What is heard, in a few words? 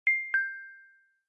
ding dong sms message tone notification